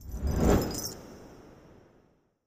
symbol Drop; wild worlds

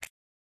button up; parthenon

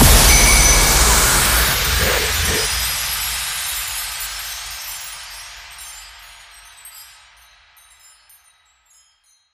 big Win Start; secrets of christmas

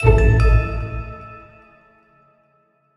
small Win Tonal A; fairymirror

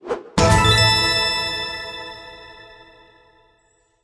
random Wilds Fall Down; finn

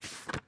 popup menu, roulette; frenchroulette3

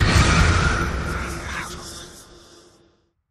three stacked land; halloweenjack

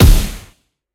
impact; spinsane

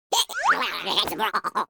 crab Win x5; reef raider

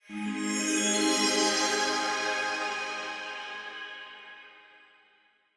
bonus Pick Display; secrets of christmas

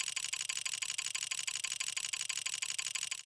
auto play; reel steal

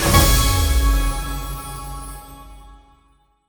big win stop; turn your fortune

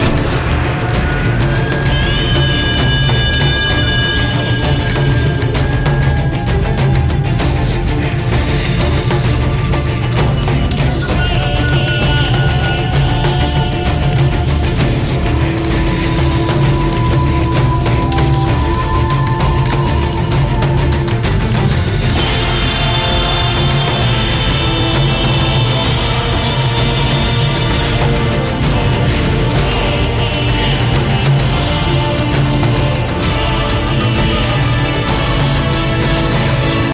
ambience Free Spin; warlords

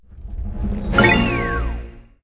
candy Win Reveal High; fairyhansel

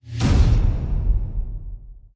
win Low; spacewars

